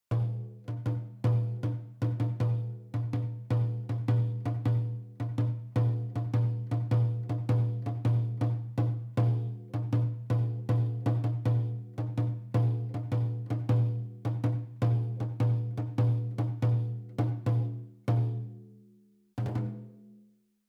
Bodhran

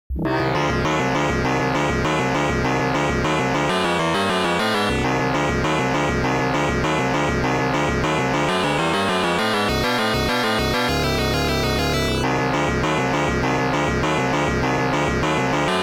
Battle; Xanadu

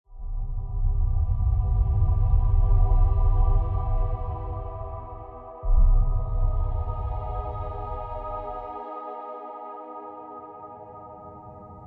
Unholy Stronghold Ambient

